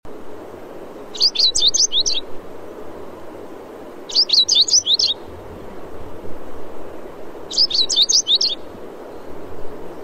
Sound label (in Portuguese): Canto do peito rosado rabilongo